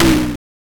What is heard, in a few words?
Power Tom, Genesis